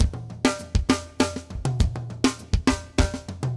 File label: brute, metal